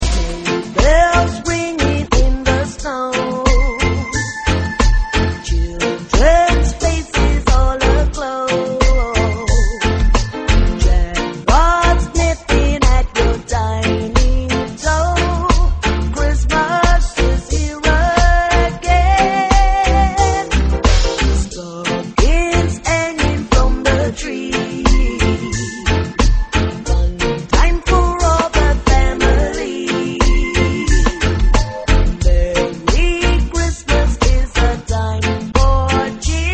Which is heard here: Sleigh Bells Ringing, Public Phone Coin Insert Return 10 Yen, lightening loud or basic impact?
Sleigh Bells Ringing